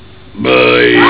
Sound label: burps